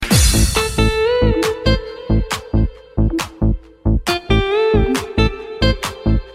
Sweet Song

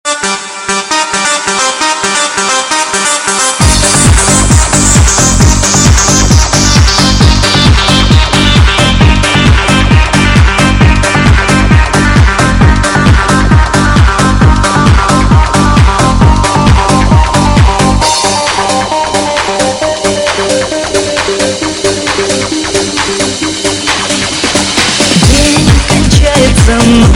Cool Beat Mix

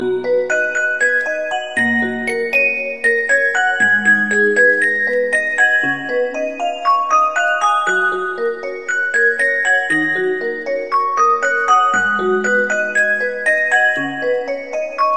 Soft Sound

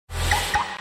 Car Lock

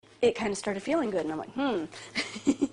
"Hmm"

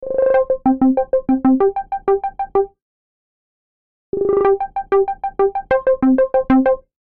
Simple Message Tone

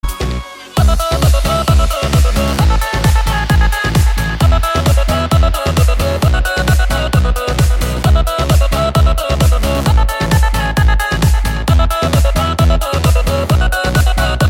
Karaoke

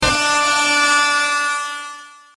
Train Horn

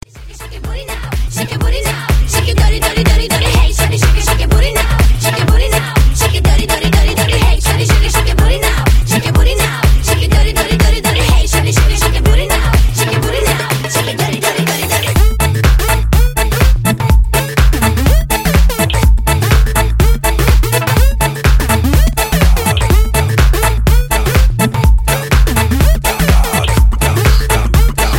Remix

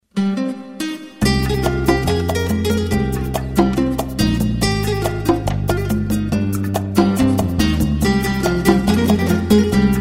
Best Guitar

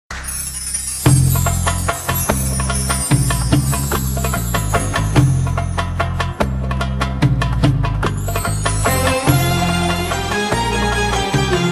Nice Oriental

